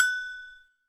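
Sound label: cowbell, drums; Yamaha SY85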